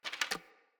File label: Hunter Bow Ranged Equip End; Weapons; Player; Bows; EnvoyHunterBowRangedMelee